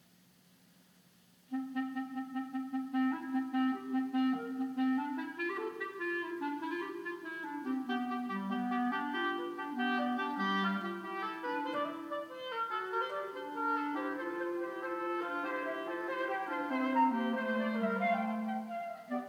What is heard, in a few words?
Fughetta, Wind Quintet; Three Organ Works; 'Dies Sind Die Heil'gen Zehn Gebot', S. 679